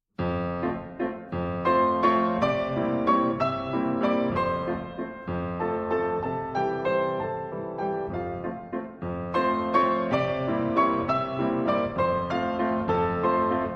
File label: Waltz in F Major; Verdi